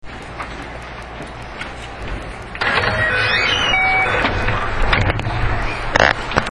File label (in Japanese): ドア開け, 225系5000番台; ドア閉め時に減圧機構が働く。; 通路上のLCDモニタ、黄色い手すり・つり革、大きいトイレが目を引く車両。